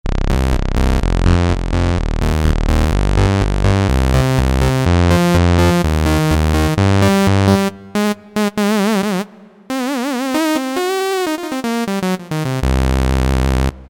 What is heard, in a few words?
Saw Wave 1, Roland U-220; Factory Timbres